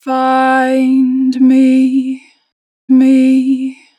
Natural Vibrato, Vocals, Find Me, 2 bar vib; Harmor Vocals

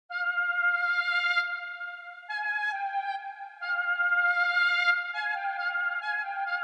Dark Melody Loop - 137 BPM F Min Clarinet; Cymatics; Oracle Sample Pack

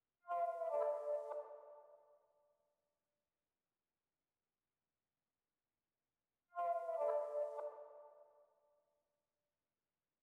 153 BPM D Min Synth Pluck; Melody; Reverse; Loop Stems; Cymatics; 2022 Melody Collection - Reverse; Siege

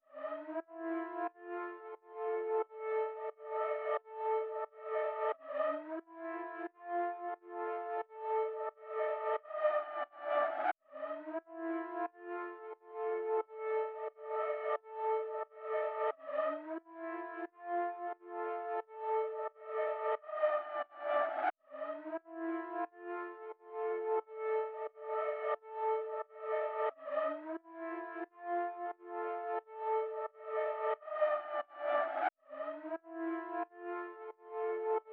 89 BPM D Min Resampled, Latin; Melodies; Loop Stems; Blanco

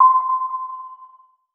KEYS (C); One Shots; Purist; Cymatics; Mystery Pack Vol 4

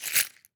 Kaeba Shaker; World Percussion; Cymatics; Emperor World Percussion

